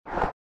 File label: dice